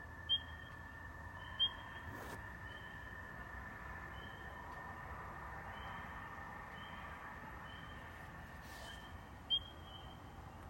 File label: Bird